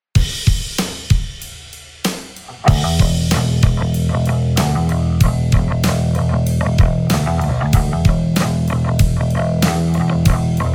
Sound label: Blues Rock Lick